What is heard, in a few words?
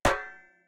Step Metal